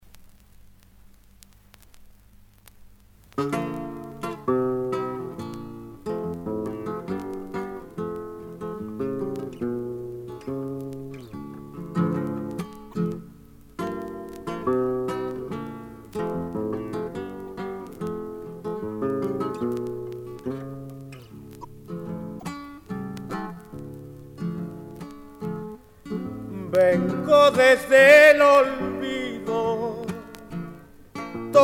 Guit Voice, Zamba

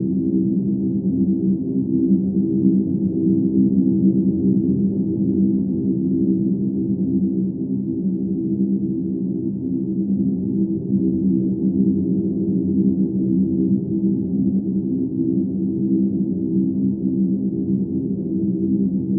C Bass, Ambient String Loops; Stratify; Diffraction

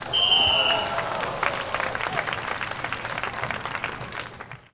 crowds

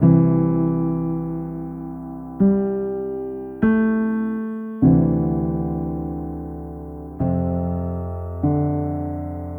Low Tide 100 C Piano Chords, Ambient Piano Loops; C and Dm Night Mood

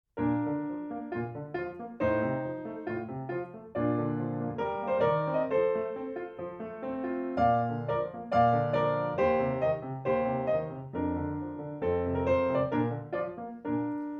Main Mics, Piano and Singer

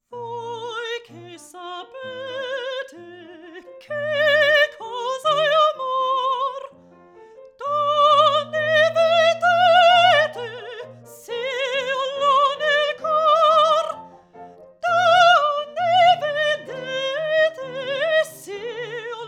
Vocal Spot Mic U67, Piano and Singer

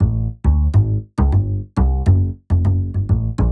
Walking Upright Bass

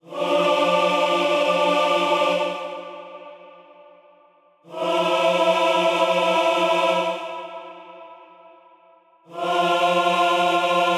Stabs Cinematic Choir D 104

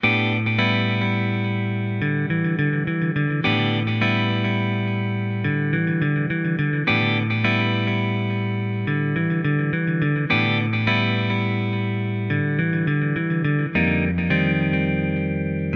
Blues Guitar Rhythm Em and Cm 70

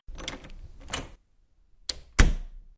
Door home open close; doorSounds

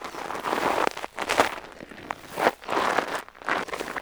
Footfalls Gravel; footsteps

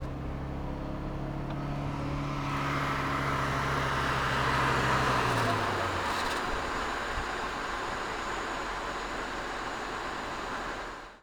cars

